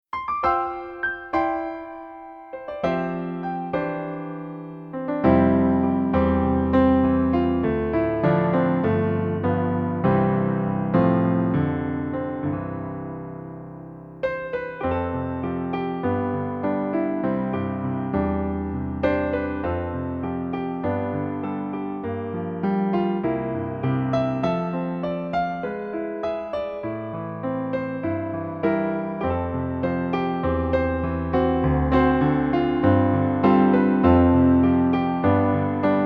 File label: Piano